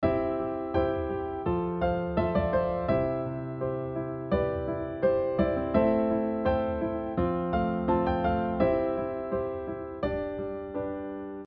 harm mel

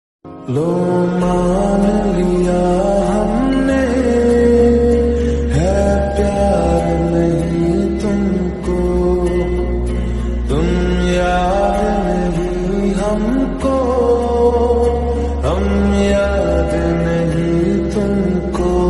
Lofi

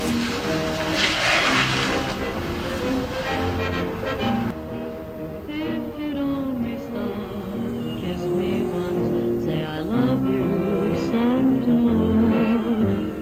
old song

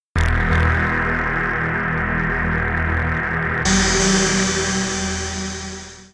Synth

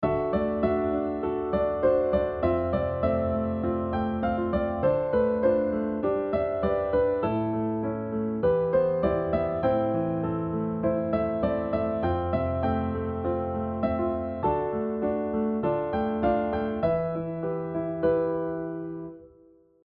mod chords melody

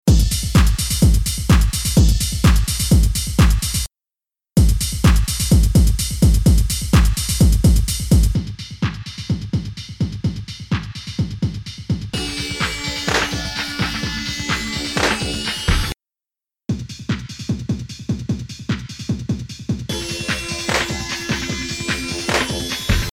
Beat

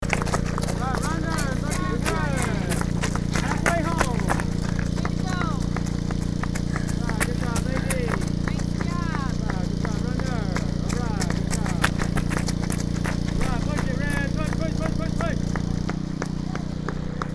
footfalls cheers

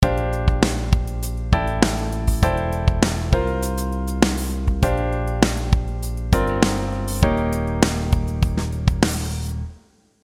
quartal 7

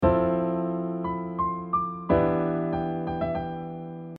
chord sub - Em

